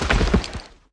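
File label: movement bodyfall; Smite; Cabrakan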